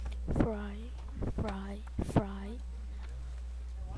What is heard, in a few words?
fry